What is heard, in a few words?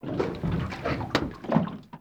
Water Lap Creak Under Dock Slow Deep; Water Laps